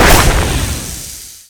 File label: venomancer venomous gale impact